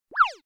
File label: cartoon zip; NCH Library